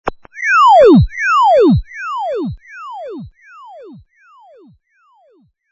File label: Repeating Laser